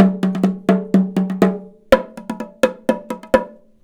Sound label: 125 TIMB, Indian Traditions; USB Soundscan vol.45; AKAI